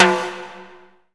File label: timbale; MEDI8OR; CLIPART